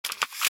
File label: reload end; war zone